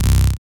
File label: dance bass; Sound & Vision - Gigapack II CD 1